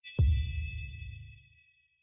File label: lobby notif bad invite; parties